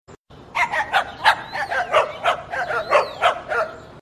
Dog

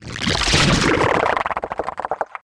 Drone

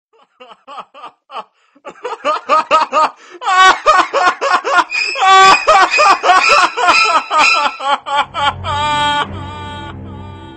Evil Laugh; Po Po

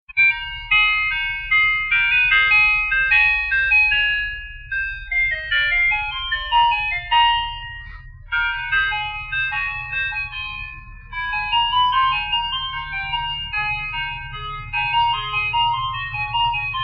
Ice Cream Sound

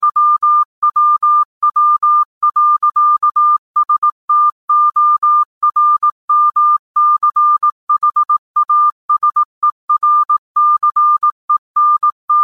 Morse Code

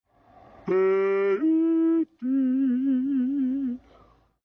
Yodel

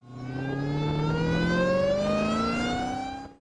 Supercharger whine

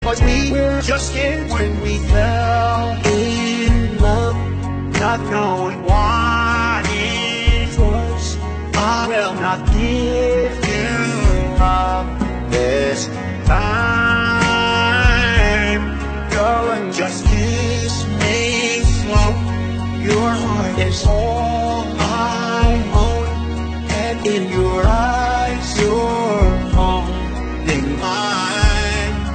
sings; Donald Trump

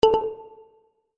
Beep

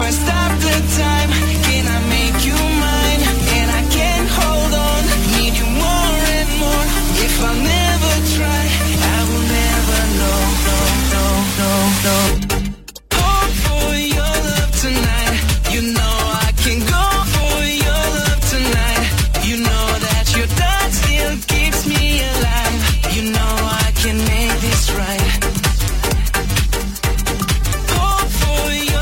House

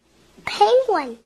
Penguin

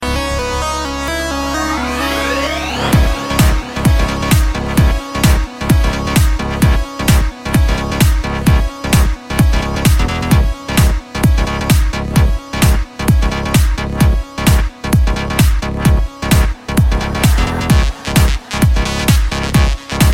New Dj Mix

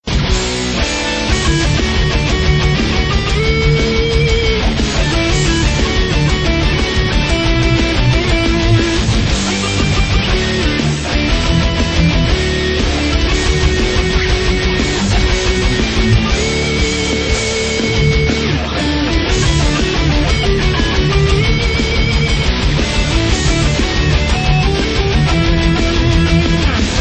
Metal

